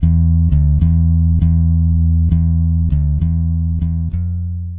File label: E Bass 100 bpm; Jazzy Bass